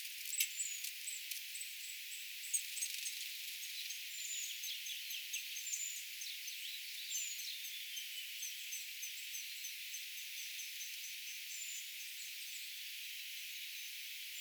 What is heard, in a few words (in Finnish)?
peipponaaraan tititi-aania nuori puukiipija; peipponaaraan tititi-ääntelyä, nuori puukiipijä